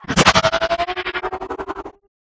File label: Sfx Baseball